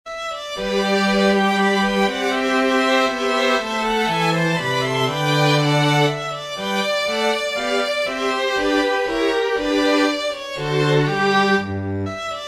minuet string quartet, synthesized audio; Classical

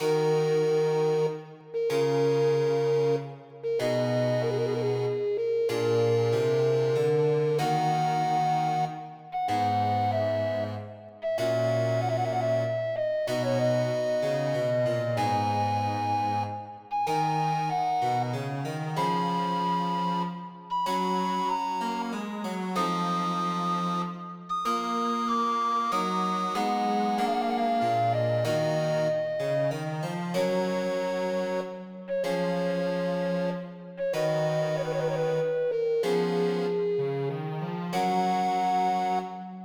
sonata recorder continuo grave

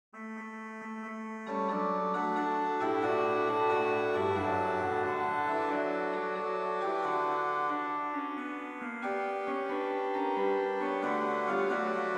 battle hymn organ; Virtual organ; Traditional

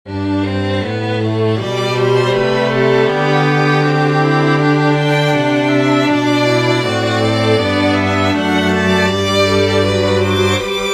double canon

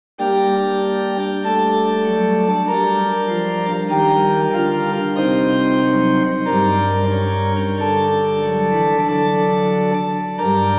bwv 768 chorale

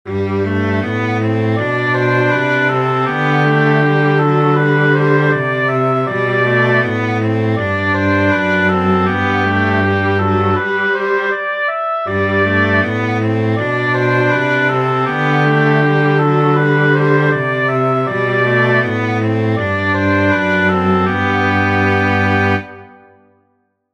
canon inversion augmentation; synthesized audio; Canon by Inversion and Augmentation

